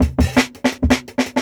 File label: Jungle Breaks; 90s Sample CDs; Original Jungle Breaks